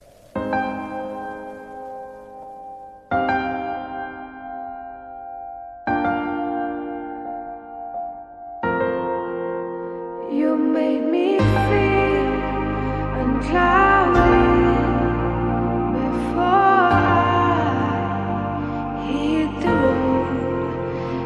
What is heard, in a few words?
ringtone; Ranam